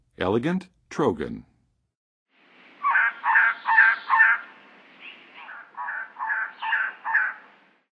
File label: Elegant Trogon, Birds of North America; Western USA; Western USA Songbirds